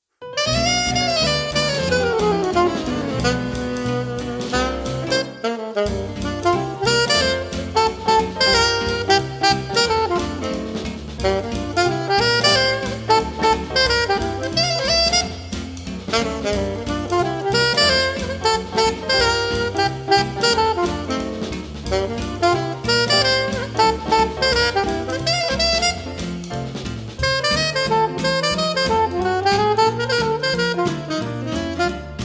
Alto Sax

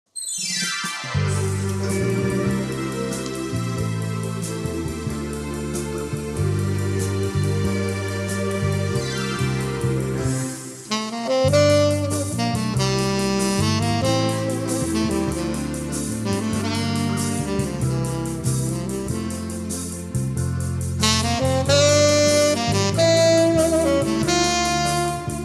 tenor

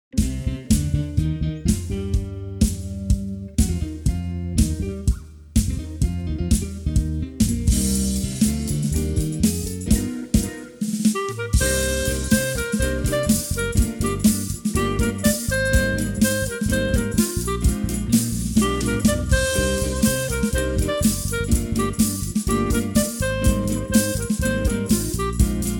GROOVE clarinet; Piano

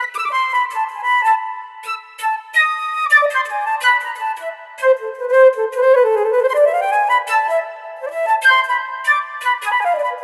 solo flute; 3 pieces for solo flute

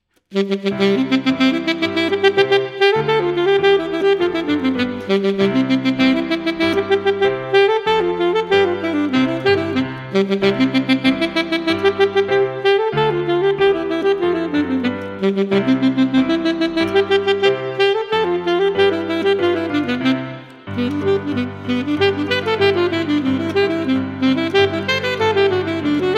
Allegro on an Arpeggio Alto Sax

